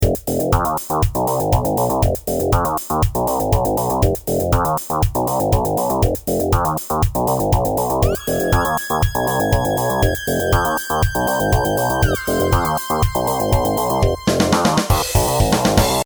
Oldschool Intro

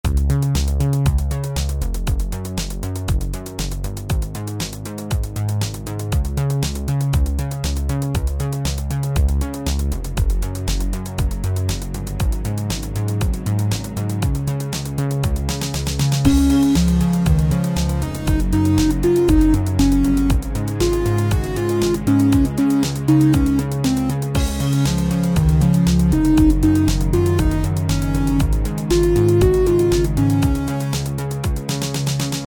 Clear Skies

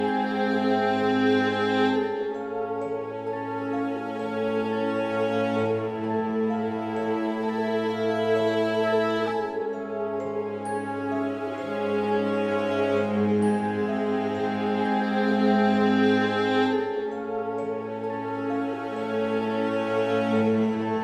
suspicious loop

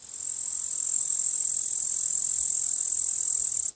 cricket chirping long; loopable

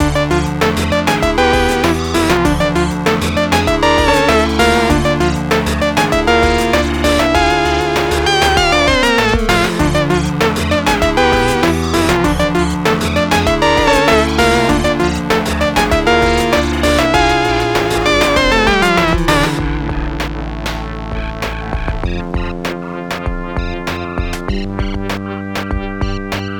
Fight Amidst the Destruction, shortloop

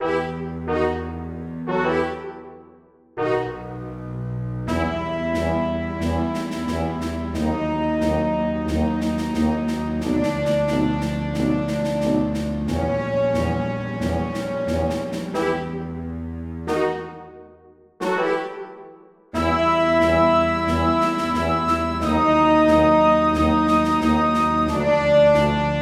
battle

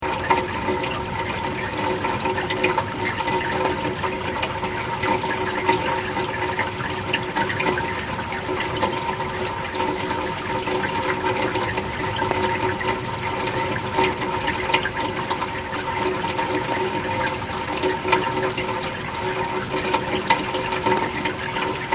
rain gutter loop

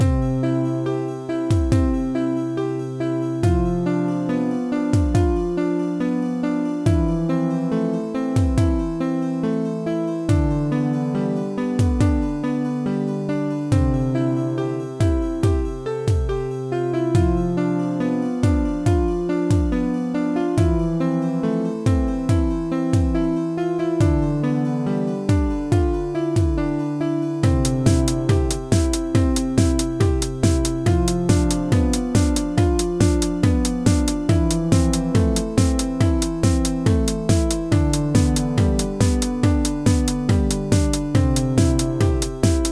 Spinning Classical

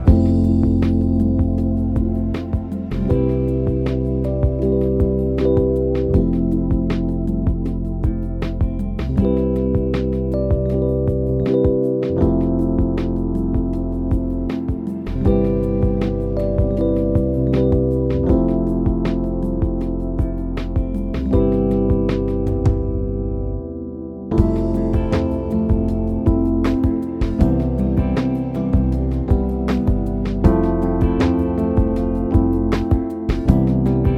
chill loop

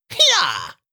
effort grunt male

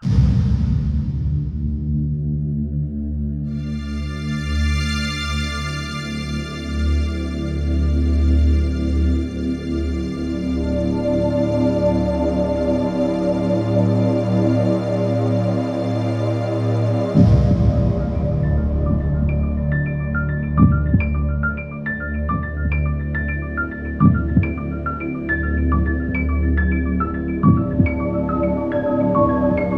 gameover

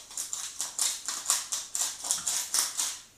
adding salt

